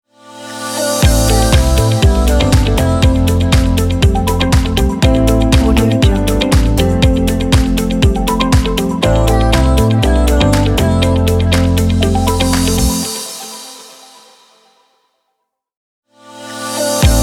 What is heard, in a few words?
miami summer electronic pop logo